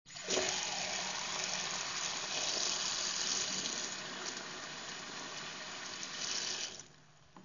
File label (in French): eau robinet